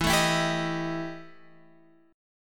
E Minor Major 7th Double Flat 5th Guitar Open F, EmM7bb5 chord; strummed; JGuitar